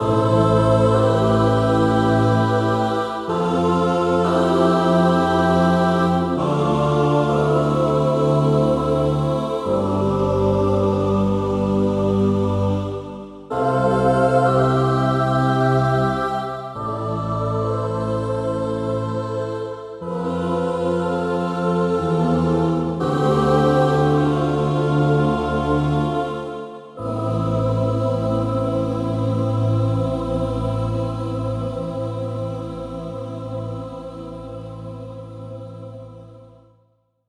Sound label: x-mas choir